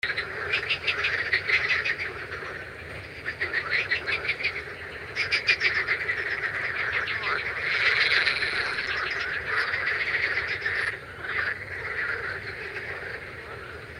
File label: water frog chorus; germany deutschland